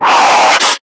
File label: Screamer Pain; Warframe; Lasrian